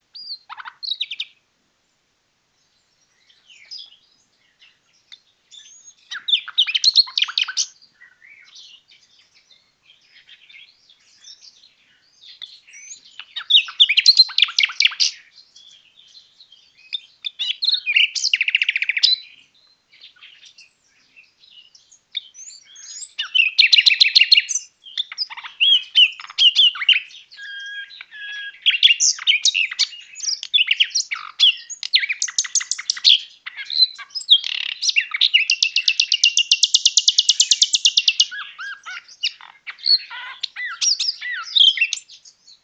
megarhynchos, birdsong

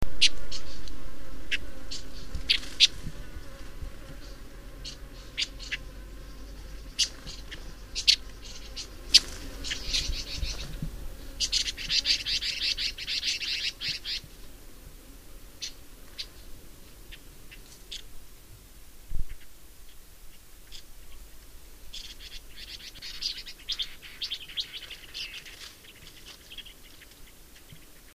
Red Billed Leiothrix

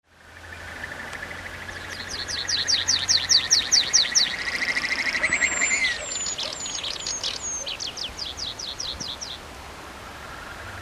Irkut AnTrivialis, birdsong

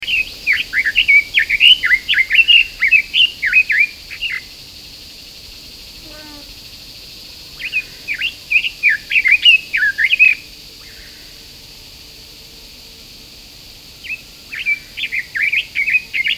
Red Billed Leiothrix, birdsong

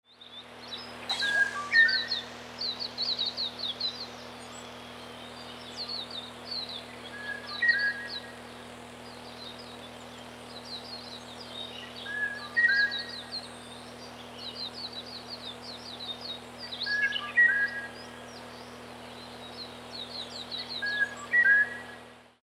ivolga, birdsong

